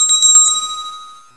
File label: bell